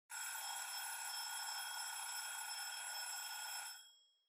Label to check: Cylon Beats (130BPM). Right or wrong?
Wrong